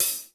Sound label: GATE HAT; Crypton - DrumBase5000; GATE KITS